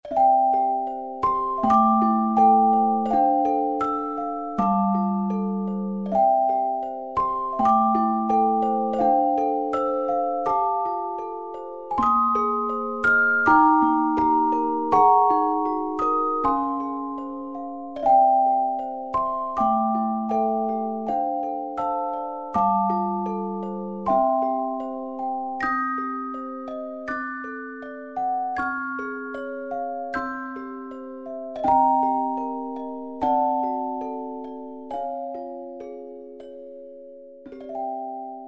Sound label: christmas tune; music for advertising&multimedia